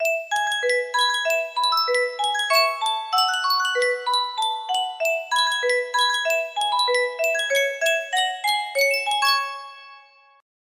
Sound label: Sankyo miniature music box